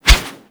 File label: Brawl Whoosh E; Warframe; Brawling